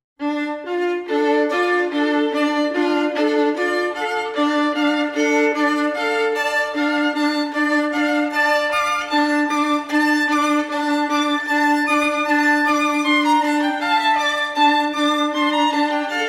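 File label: Concerto for two violins and basso continuo with orchestra 1st movement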